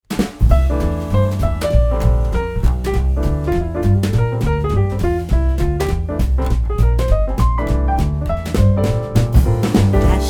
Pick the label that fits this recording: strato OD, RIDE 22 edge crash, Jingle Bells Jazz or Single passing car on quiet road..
Jingle Bells Jazz